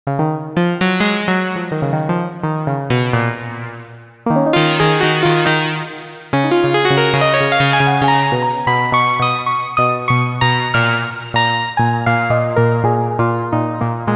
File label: short synth keys; the digital life